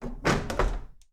Finestra; BLIND QUEST - The Enchanted Castle

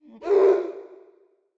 Mezzo Grido Tortura

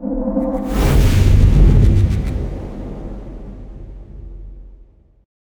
Soffio Di Nebbia

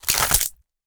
Ossa

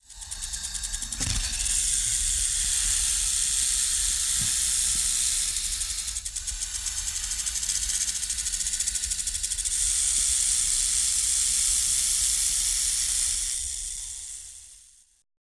serpente; Inquisitor 2

